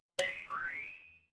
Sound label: cartoon boing